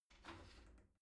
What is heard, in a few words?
shower slide door slide open soft